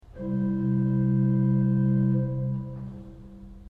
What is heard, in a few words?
I flauto amabile C